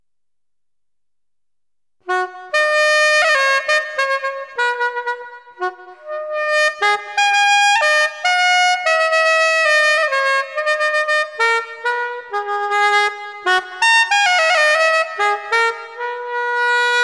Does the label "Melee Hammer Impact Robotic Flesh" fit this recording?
No